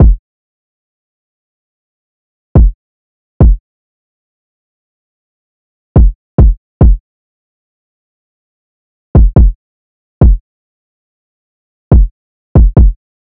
Drum Loop - 141 BPM Kick, Drill; Loop Stems; PARADOX II - Drum Loop Collection; Cymatics; Harpoon Drum Loop

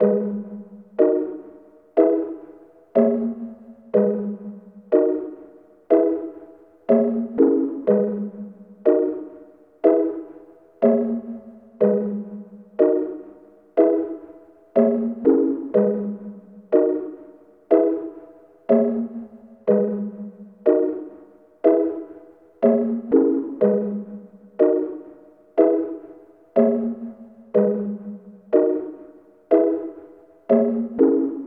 122 BPM G# Min Pluck Pad, Loop Stems; Cymatics; 2022 Melody USB Expansion; Class

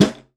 FX Snare

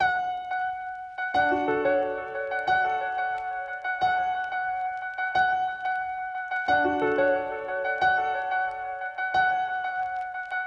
Classic Melody Loop - 90 BPM D Maj Piano; Loop Stems; Cymatics; 2020 Melody Collection

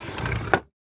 Material Wood Lever; Game Essentials

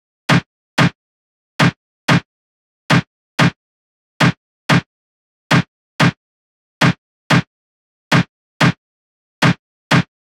Drum loop - 95 BPM Snare, Latin; Loop Stems; Astro

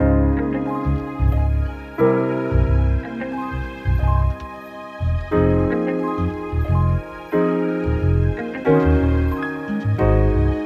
90 BPM G# Maj, Hip Hop Melodies; Dreamland

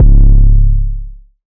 808 - C#; 808s; Cymatics; Cobra Hip Hop Sample Pack

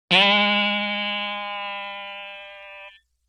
Boing Box Higher; Vintage Cartoon; Hits & Tones

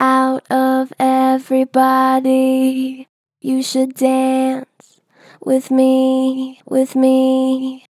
Pitch Flat Gentle, Vocals; Harmor Vocals

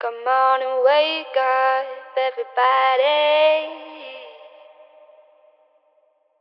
Phrase Wet - Wake Up - 70 BPM C Min, vocals; Cymatics - Eternity Sample Pack; Phrases

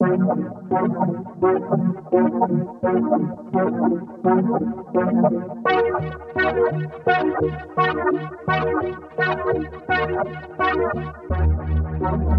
170 BPM D# Min; Doomed; Cymatics; 2022 Melody Collection Platinum Expansion

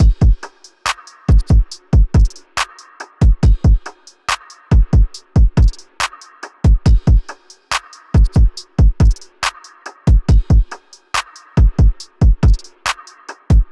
Drum Loop 140 BPM; Mind Games; Cymatics; DOPE DRUMS VOL. 1

